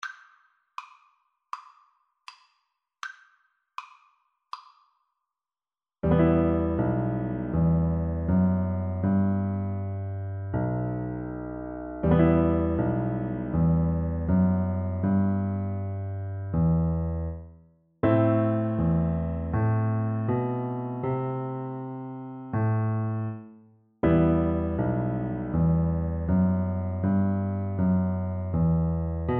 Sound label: piano duet